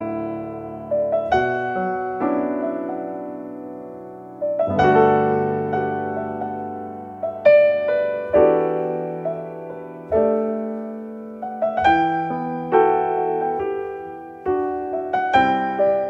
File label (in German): improvisation